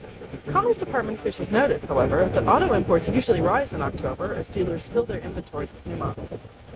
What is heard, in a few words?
real bus